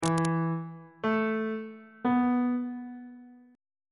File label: d double sharp suspended 4th triad chord intervals on bass clef